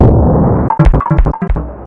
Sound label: Secret Door, Bonus Area entrance sound effect; DKC2